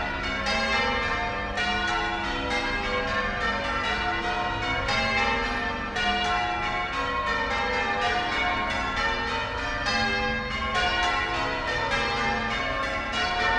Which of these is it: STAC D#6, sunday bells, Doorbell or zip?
sunday bells